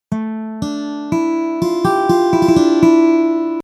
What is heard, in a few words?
ac guit